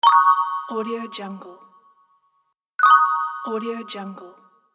notification blip

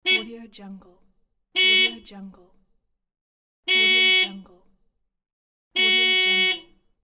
car horn

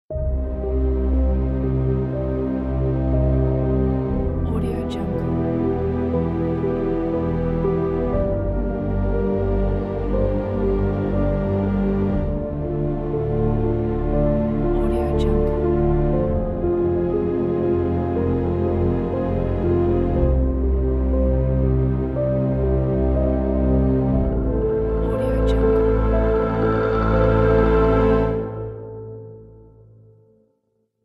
slow tension; ilovemedia-es

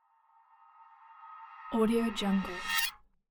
suspense riser

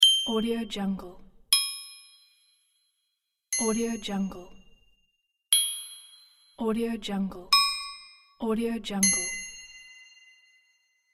ding

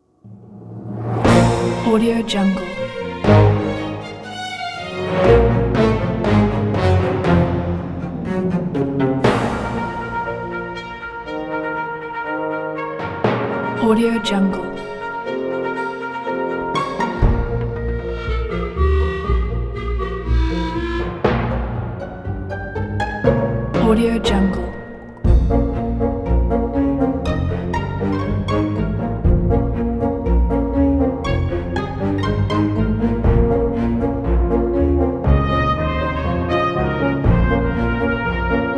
fun cartoon trailer music

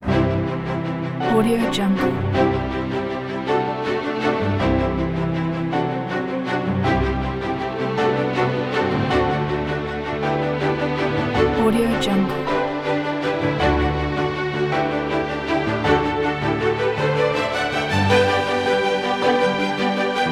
anticipation of happiness

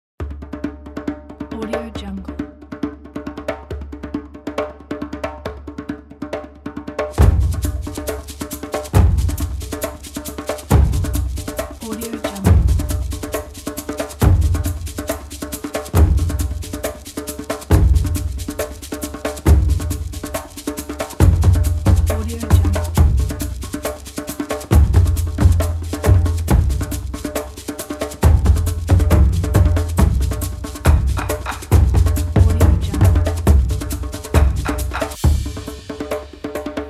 tribal ritual dance percussion

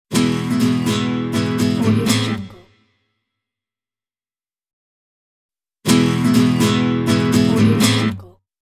nylon acoustic guitar spanish accent

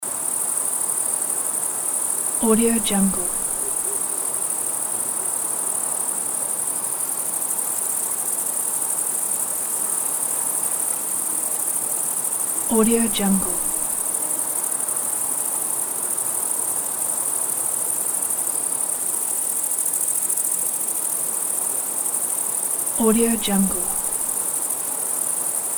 cicada

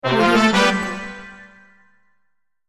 really useful fanfare award

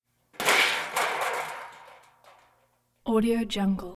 trash can

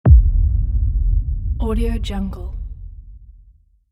cinematic low hit; starmusicproduction

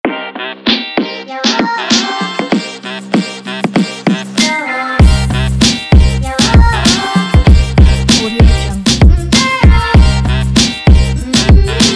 old school hip hop

